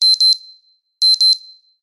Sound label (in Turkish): güçlü bip, beeps notification